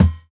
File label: Kick, jungle breaks drum sounds